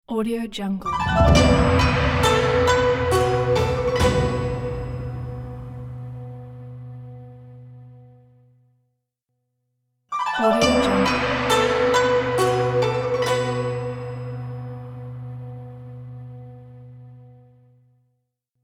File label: chinese logo